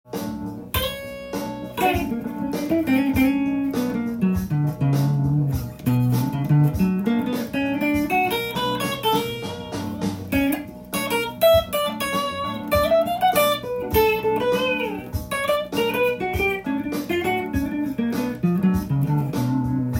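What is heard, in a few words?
B minor melody, guitar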